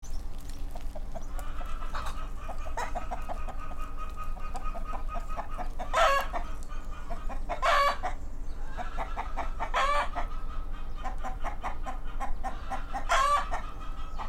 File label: chicken sound; صدای مرغ